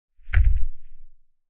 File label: Low Rumble Sweetener; Hollywood Edge; Martial Arts and Human Impacts